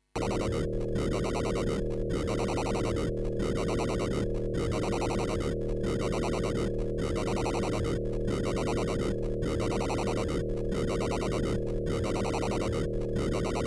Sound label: yamaha dx9 egs glitch